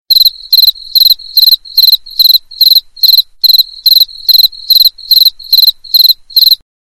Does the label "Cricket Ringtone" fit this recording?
Yes